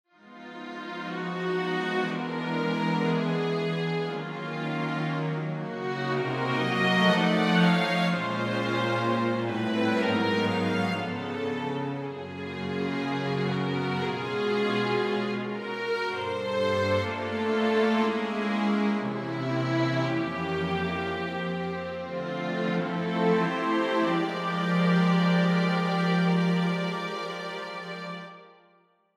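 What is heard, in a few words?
Orchestral Strings phrase; VSL special edition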